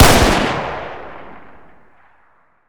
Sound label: sr25 distance fire